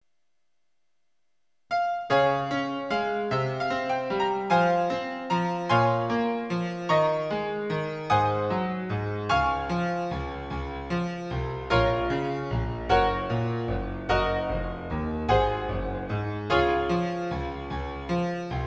Preludes No.11 in Db, piano

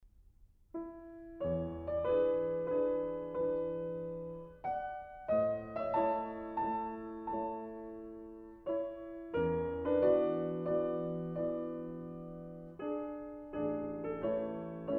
Prelude in A major; Chopin; no. 7

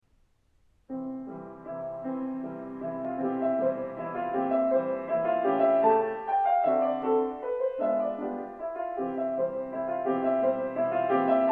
Fleurs mélodiques des Alpes No. 1 C major

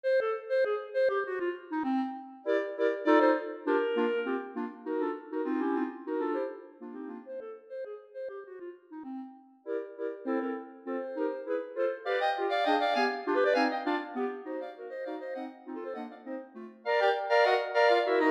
Ragtime version for clarinets

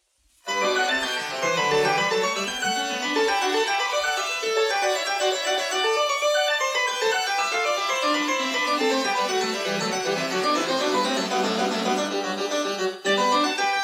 Variatio Clav.

